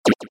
sound hit

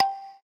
button; tangguo

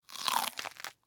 eating candies; game; dapopinata

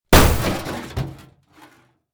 impact Crash; game

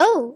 touch woman

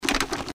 fail

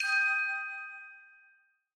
ding

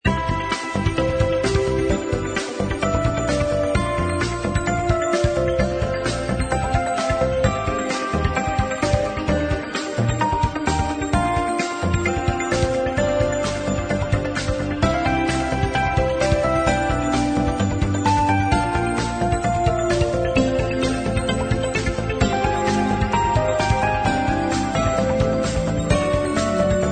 bg music

